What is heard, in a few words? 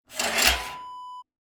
water tank lid slide close